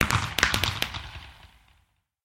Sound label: twinkle far, fireworks; ayuncraft-1.5